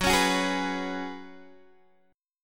F sharp Suspended 2nd Suspended 4th, Guitar, DADGAD; chordshape; down